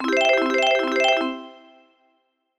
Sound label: Jingles; Atomicat